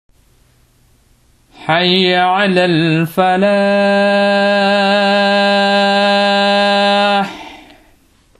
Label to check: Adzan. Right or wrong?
Right